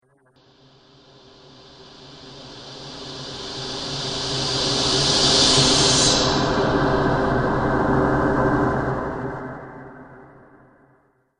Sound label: Alien Future Whoosh; Alien Sound Effects